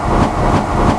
energie

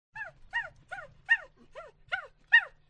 singe

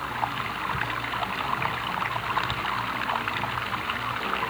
eau

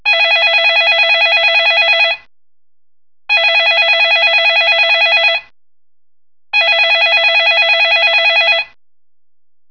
sonnerie; mojacar

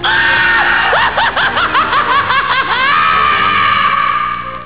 rire diabolique; csde rambo halloween

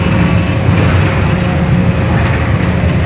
cheminee machine; de old industry

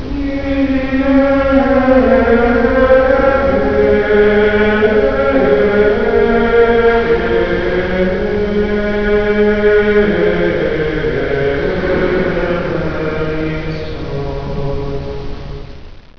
chants moine

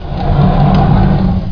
Porte Coulissante

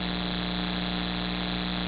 lampe

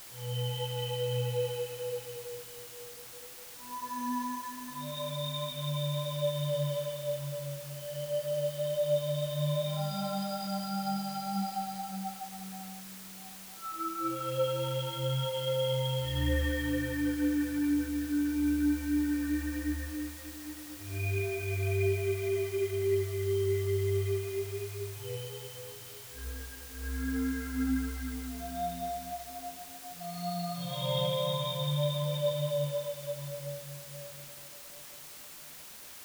son peur; de enfer2k

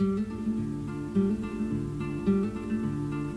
guitare; deagle ~el~duelo v7b

